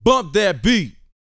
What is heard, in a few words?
VOX F, Vocal Planet R&B & Dance HIP-HOP; ILIO